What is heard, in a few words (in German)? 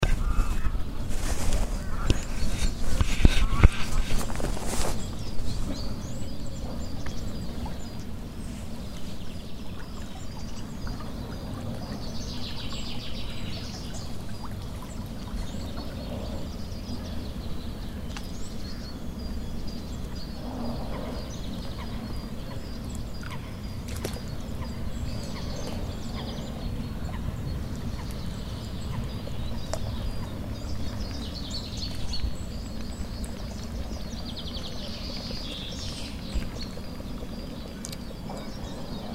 seegeraeusche